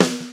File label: Snare